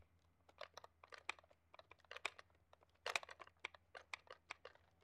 rustling plastic

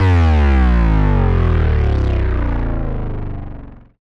Bass Drop; SoundsCrate

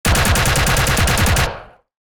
Automatic Weapon Fire

